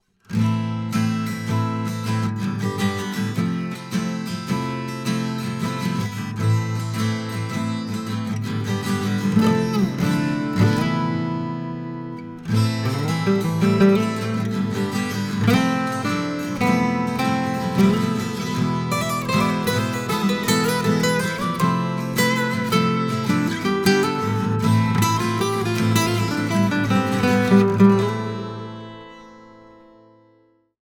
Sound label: Acoustic; Scarlett Solo; focusrite